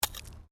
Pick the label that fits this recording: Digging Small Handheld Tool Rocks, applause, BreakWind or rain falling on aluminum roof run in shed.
Digging Small Handheld Tool Rocks